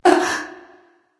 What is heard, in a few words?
surprise; smite; nox